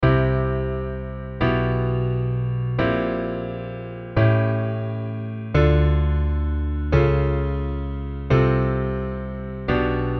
cliche bass major

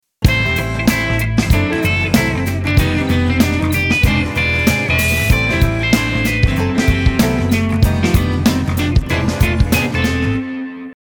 shuffle

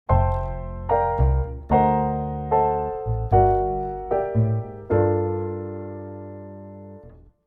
voicing block octave

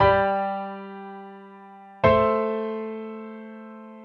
cadence deceptive

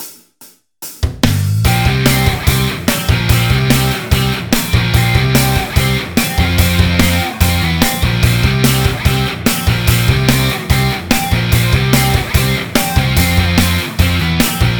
rock

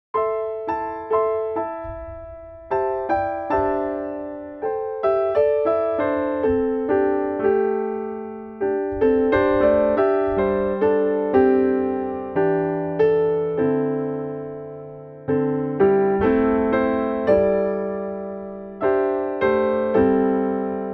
penta minor

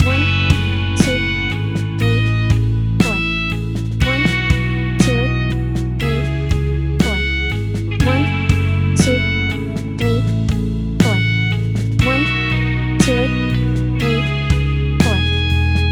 BPM60

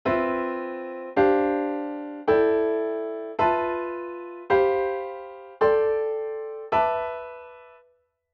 quartal chords

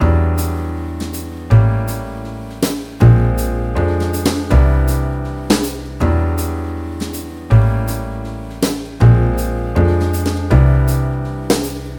jazz3625

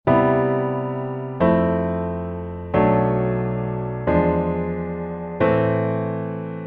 parallel major VIIm

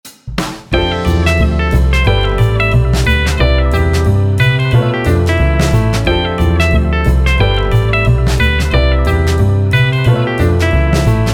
harmonize max jazz

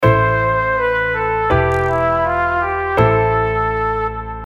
minor natural

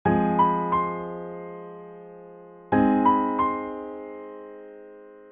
A flat harmonic

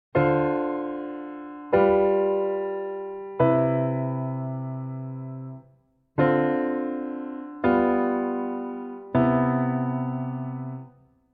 voicing 5th

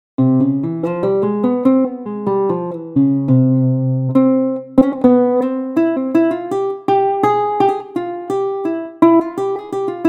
scale arabic

